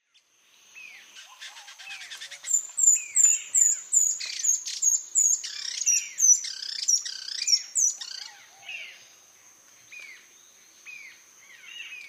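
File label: Speckled spinetail, bird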